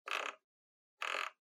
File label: Portable Stereo Dial